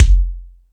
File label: Dance 2000; E-MU Pro-Cussion